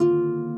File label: harp